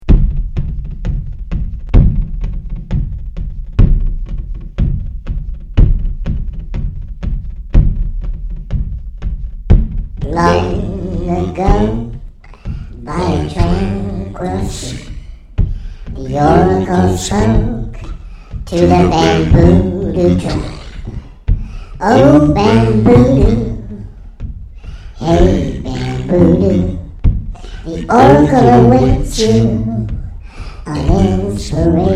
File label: bamboodu chant